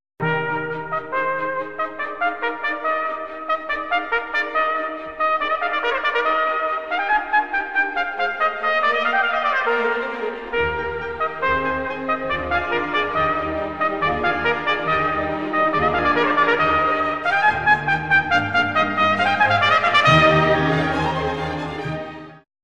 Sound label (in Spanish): Trompeta; Haydn